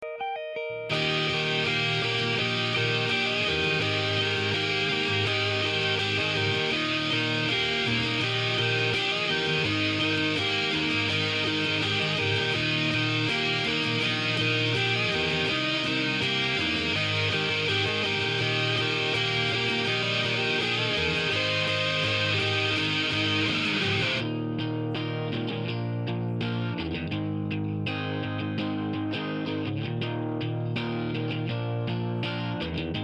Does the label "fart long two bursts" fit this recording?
No